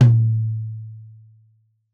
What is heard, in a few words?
Tom2 Stereo, Deeply Sampled Drums